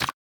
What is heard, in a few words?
click Btn; b23 zuma